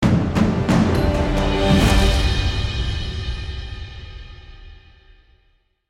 Combat Stinger

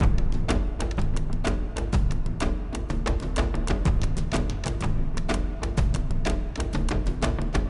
sounds music

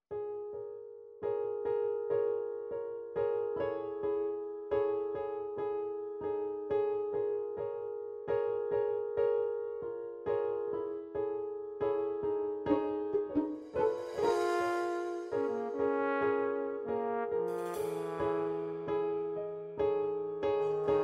Bossa; K.K. set list

